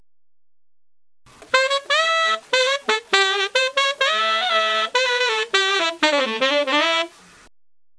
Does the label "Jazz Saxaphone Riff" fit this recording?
Yes